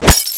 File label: break glass